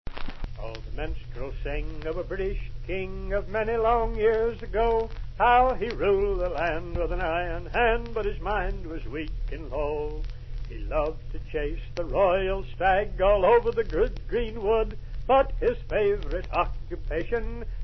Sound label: 1939 bawdy song; lomax